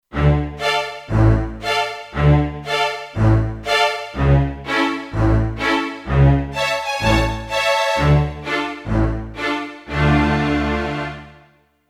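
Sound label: Attack control Fast Strings; Kurzweil K2500X; Factory Programs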